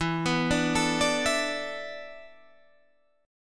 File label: E 7th Suspended 4th, Guitar, Half Step Down